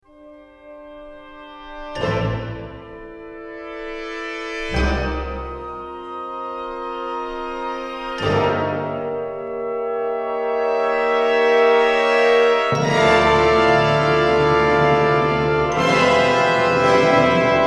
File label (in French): attq resonances inversees